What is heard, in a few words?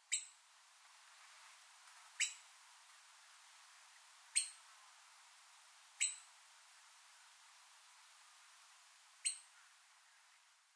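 Okinawa Woodpecker, yambaru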